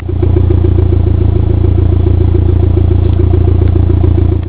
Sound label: MR2 Idle; 94 MR2